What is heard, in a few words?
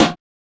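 snare drum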